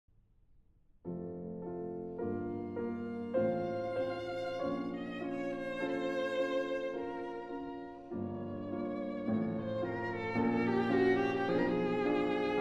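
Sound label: piano trio; taneyev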